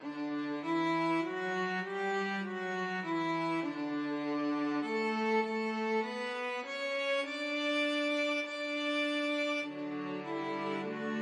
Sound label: STRING quartet